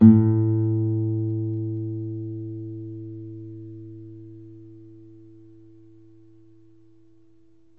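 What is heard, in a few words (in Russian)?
guitar single warm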